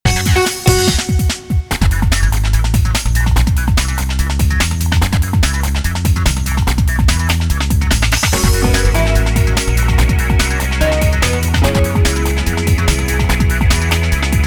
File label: Robotic Electronic (Loop); Network Production Music; NM288 Tradeshows